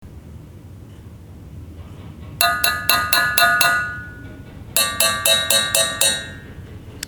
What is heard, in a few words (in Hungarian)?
bögre hang